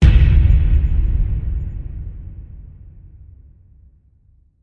Hit Lo Big Feet; Brand X Music; Brand X Music Catalogue - Editor's Essentials

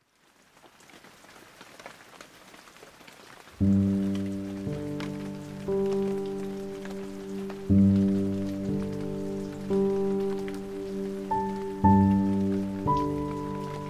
Piano (With Rain)

